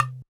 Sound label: EQ Perc, Percussion Mix